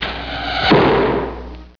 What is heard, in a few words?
Arrow Hit